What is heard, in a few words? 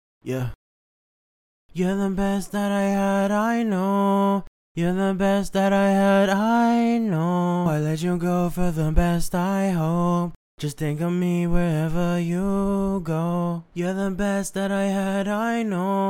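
pop, 80, F minor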